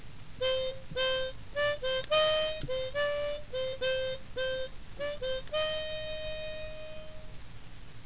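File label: harmonica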